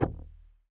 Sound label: noise muted strum, swag bass